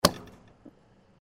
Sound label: tank full; Fuel